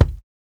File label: Kick Shuffle